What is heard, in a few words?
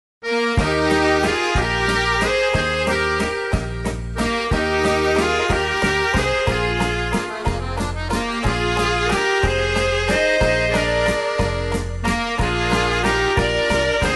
Waltz